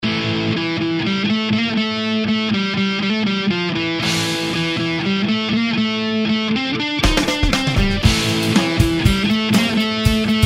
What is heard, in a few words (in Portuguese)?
rock psicomelodico